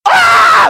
delirious scream

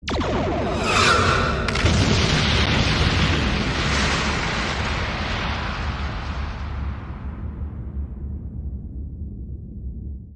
photon explosion

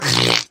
wet fart

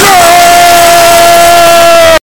knight scream; clash royale

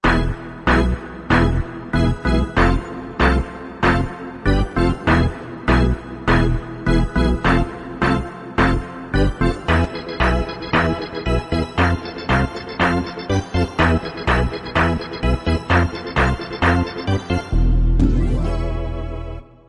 piano

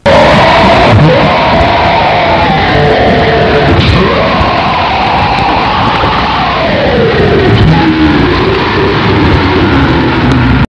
scream, vhs; golden freddy; fnaf

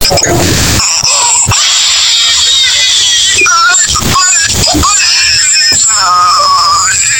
baby crying

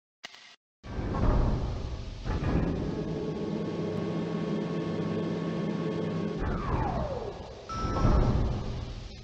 elevator; mgs

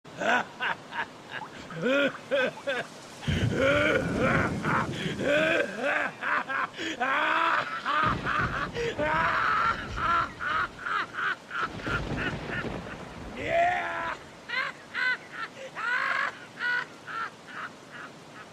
giggling; ganon; uncontrollably

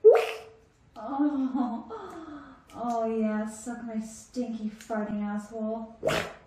chocolate pudding farts

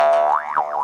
boing

